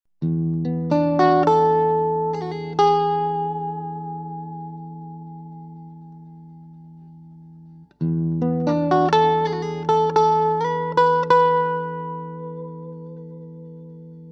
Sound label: Gretsch Clean; space tone